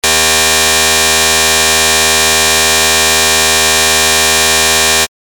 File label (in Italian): suoneria beep